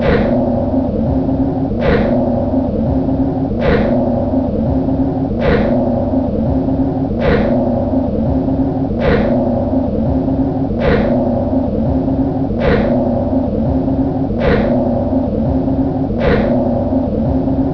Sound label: door move; ze labs076